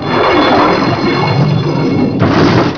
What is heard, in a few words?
IRON SLAM; MOVEMENT